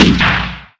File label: mg42 cooldown; Modern Warfare